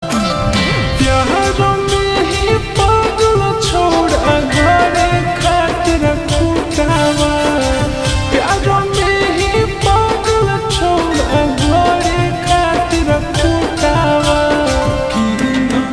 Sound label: Ringtone slowed and reverb